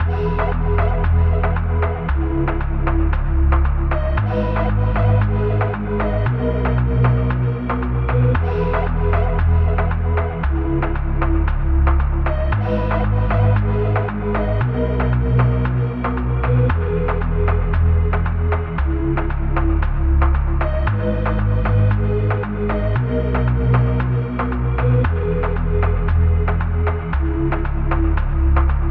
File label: 115 BPM G# Min (Latin), Melody; Cymatics; PARADOX II; Melody Collection